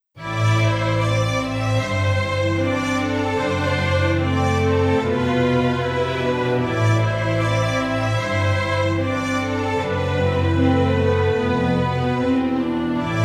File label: Theme romantic mystic